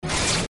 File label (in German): Ori Stabwaffen Feuer